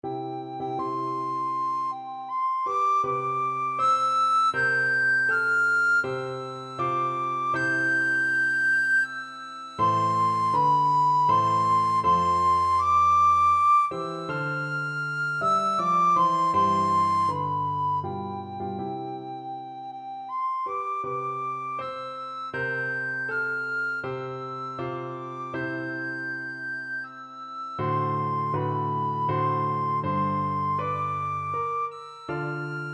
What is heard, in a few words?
nocturne, recorder